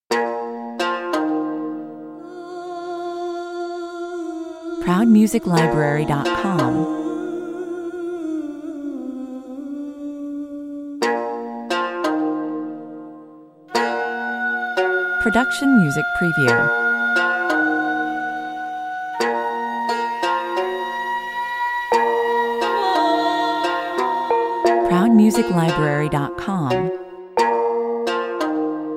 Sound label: seductive mirage (no drums) 88 bpm, Oud, female voice, bells and flute. Haunting and hypnotic Middle Eastern tune